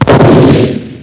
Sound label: explos; 17 Bit Software 2: Collection B